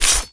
metal claw

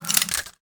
prune the branch

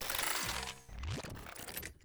celestial globe off

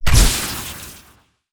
elec wand overload

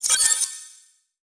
ui settlement team

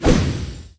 dualswords

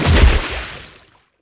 hydraulic

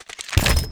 p atk whlcannon

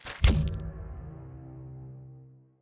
ui gear

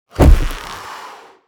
fall air bed

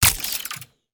p atk icecamera

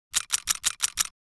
ui positive income

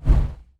atk spear fire

